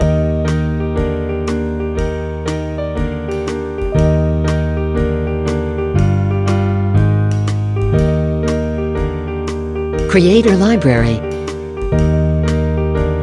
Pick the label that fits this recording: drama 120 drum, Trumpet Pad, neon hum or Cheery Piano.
Cheery Piano